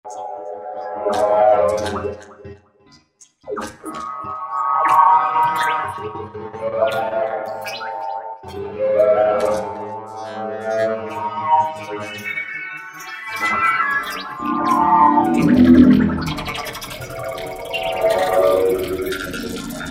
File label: Roland V-Synth; RevrsPfWater; Factory Patches